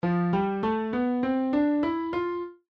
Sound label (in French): mode de fa